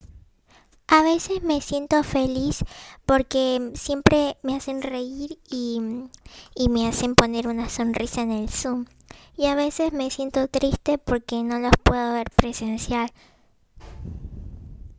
emocion

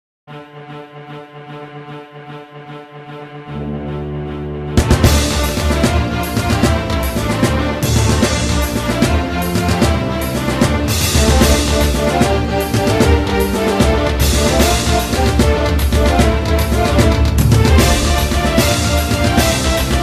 Piratas del Caribe Música Banda Sonora Instrumental

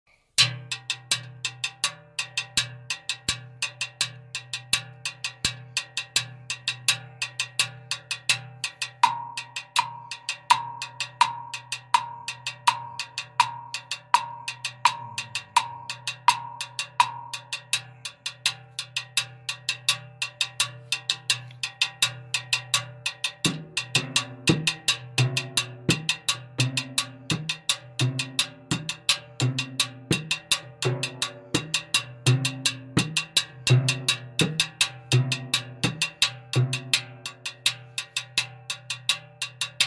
TIMBAL PATRON BASICO PARA TOCAR CUMBIA

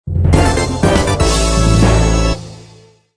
informativo noticias separador musical

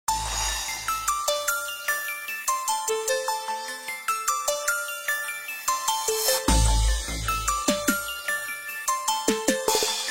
Musica de fondo; para Vídeos de Tecnología

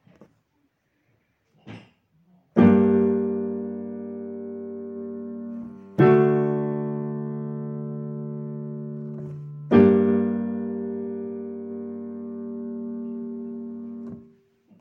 Cadencia

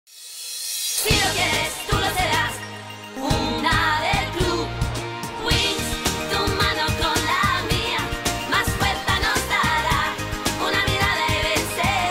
Canción de apertura; Winx Club España; Temporada 1

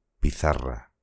pizarra

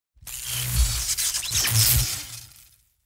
sonido de electricidad

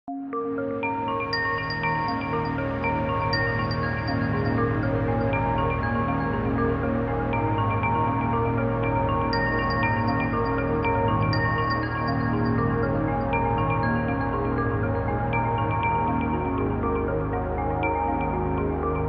musica corta alegre musica corta de fondo

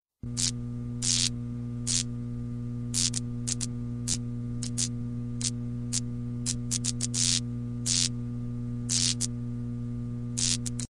efecto sonido neon

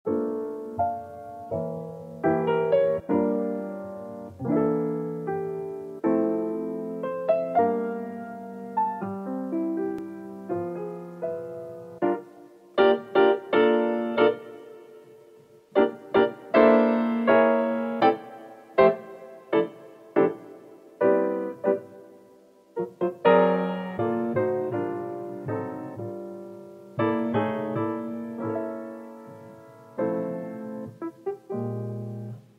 Bonita Musica de Fondo

